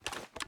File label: reload start; gmod